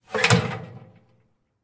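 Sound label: sound of a metal lid swept off a box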